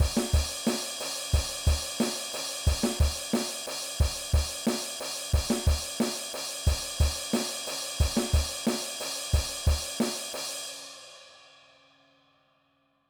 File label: Rock Drum Beat